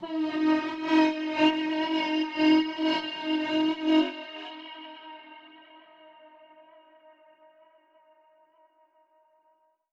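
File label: Reverb Proc, alarm siren samples, 120bpm; musicradar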